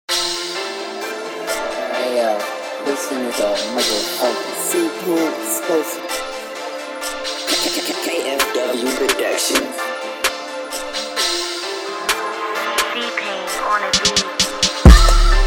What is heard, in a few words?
Instrumental